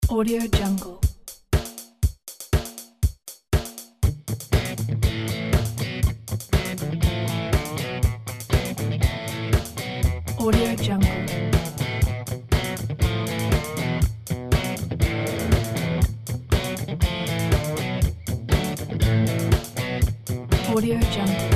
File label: bluesrock punch loop; spaceangel